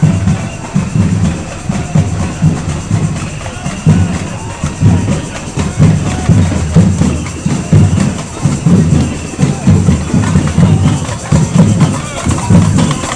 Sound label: samba band